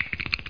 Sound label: shake